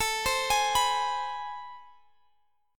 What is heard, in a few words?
A Minor Major 9th, down